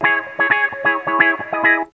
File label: minor funk, guitarra; loops; sampleswap